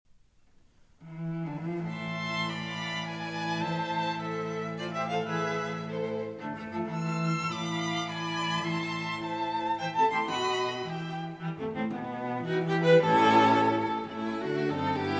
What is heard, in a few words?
String Quartet No. 2 in A Minor; Op. 51, No. 2; Brahms